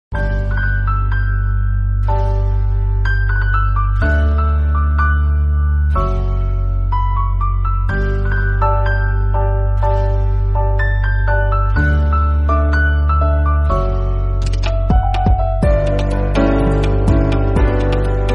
Instrumental

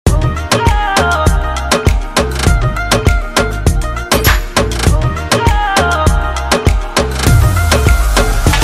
Instrumental; Satyameva Jayate 2

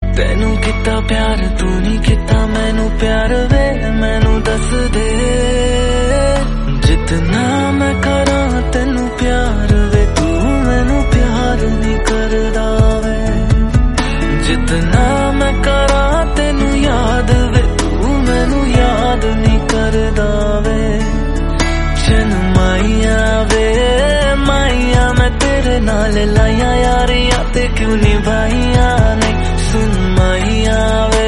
Hindi Song

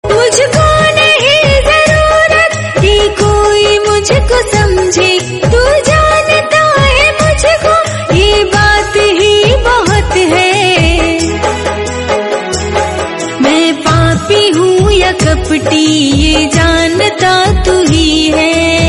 Shree Krishna Song

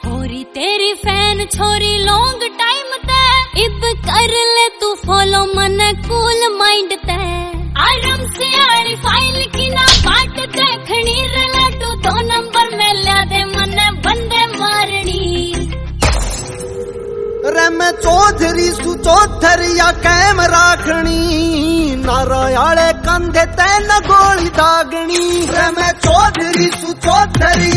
Haryanvi Song

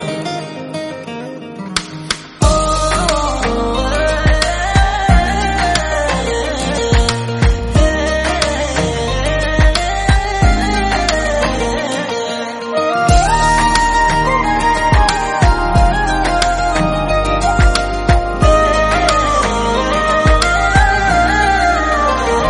Instrumental BGM

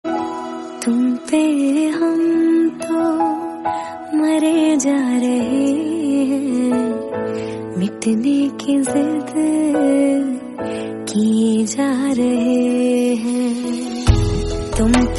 Female Version

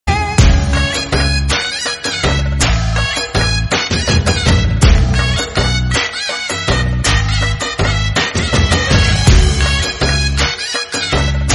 Instrumental

